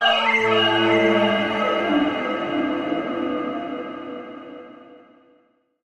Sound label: Hollow Future